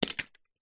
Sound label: leisure toy car single car drop onto others on carpet; hotwheels